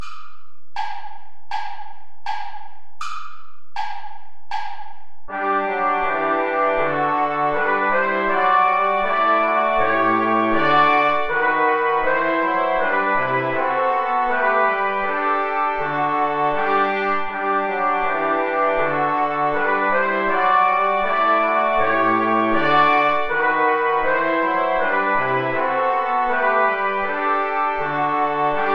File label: play along without trombone